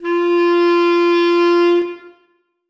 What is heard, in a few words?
bass clarinet f4; Sonatina Symphonic Orchestra